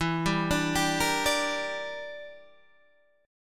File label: E Minor 7th Flat 5th E, Guitar Standard; Em7b5 arpeggiated; 12,10,12,12,11,10